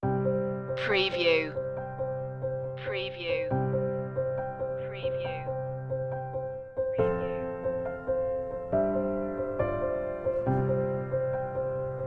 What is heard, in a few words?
solo piano